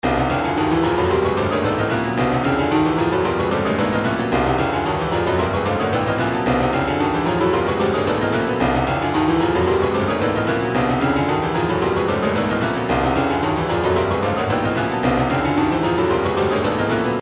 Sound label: retro funk 112, midi; mind meld